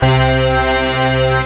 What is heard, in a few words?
str 8va C2; Alone in the Dark Demo; Adventure